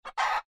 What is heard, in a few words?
rooster bauk